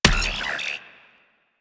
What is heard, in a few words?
Drone Death; Warframe; Weapons; Archwing; Powers; Archwing Healer